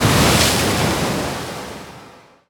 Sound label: riptide; weapons; dota; naga siren